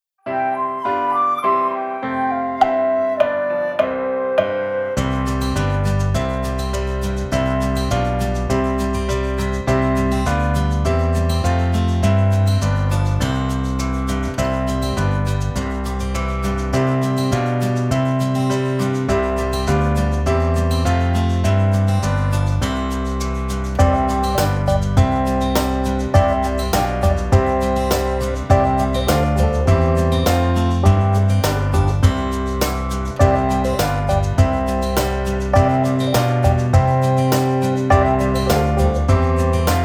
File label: Daw Hyfryd Fis Instrumental